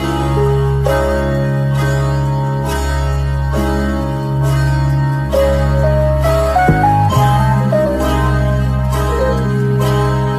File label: oceans of wah, Electronic Loops